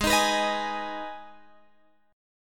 Minor, Guitar, Open F; G#m; strummed; x,15,15,18,18,15

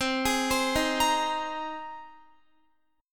C Minor 7th Sharp 5th C Guitar; Cm7#5 arpeggiated; x,15,18,17,15,17

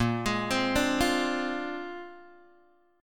Bb 9th Bb down Guitar Open G

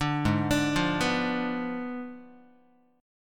G sharp Diminished 7th, Guitar; G#dim7 arpeggiated; JGuitar

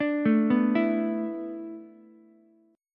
A Suspended 2nd Suspended 4th, down, Ukulele; Asus2sus4; arpeggiated; JGuitar

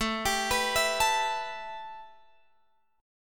A 7th Suspended 2nd A Guitar Drop D, chord

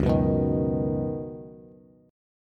Db 7th Suspended 2nd Suspended 4th, Bass; JGuitar

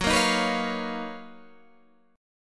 B Major Sharp 11th Gb

